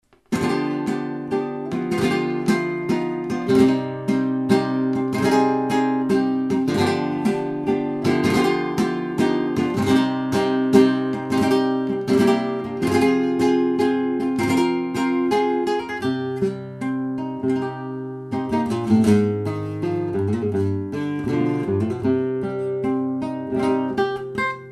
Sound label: farruca, flamenco